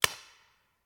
Paintball Gun; Sound Ideas; Guns

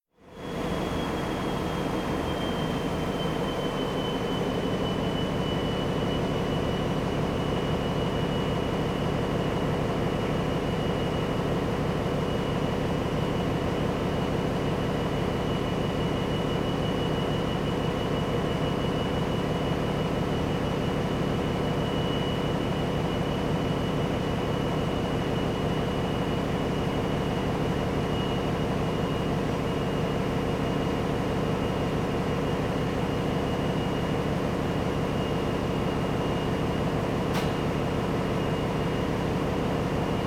Washing machine; KMP Film Video Sound Effects

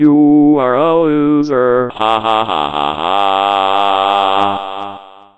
lose; Quentin C's Gameroom

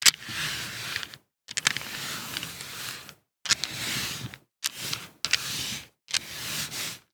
DICE on Felt, Slide, Standard, 4 Four Dice; Samples; ArticulatedSounds; DICE Sfx Party (Extended)

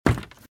Footsteps - Wood - Creaky - Run - Right

